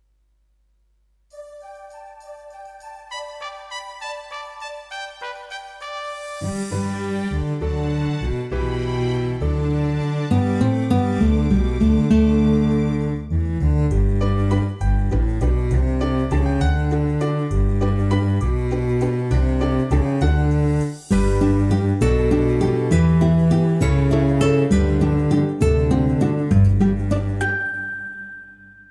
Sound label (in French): bon roi dagobert karaoke